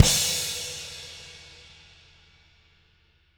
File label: CW KIK -L, Living Drums, SD CYMBALS; Sampleheads; Peter Erskine